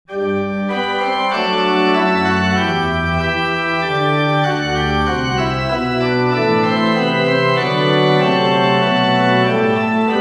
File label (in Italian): organo